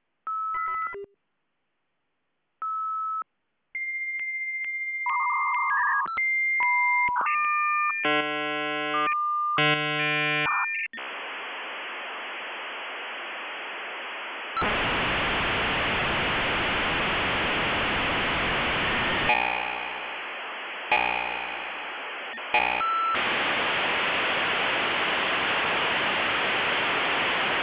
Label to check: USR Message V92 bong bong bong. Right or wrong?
Right